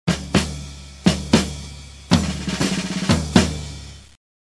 drum march ringtone

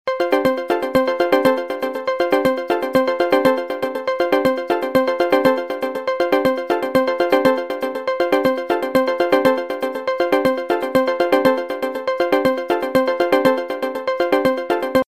iphone 5s ringtone

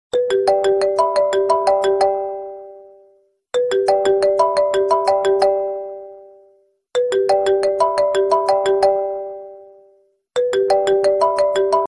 iphone ring

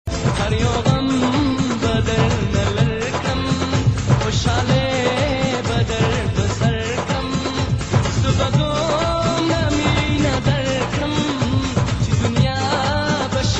pushto ringtone

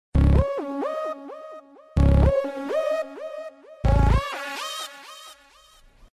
cool sms ringtone

